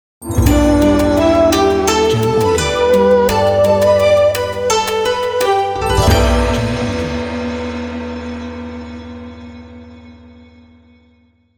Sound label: Asian Logo